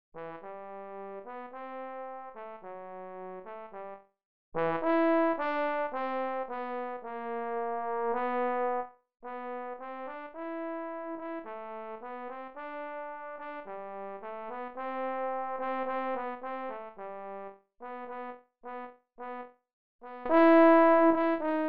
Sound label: trombone; UVM Band